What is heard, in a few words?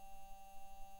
mic, mars2020 edlcam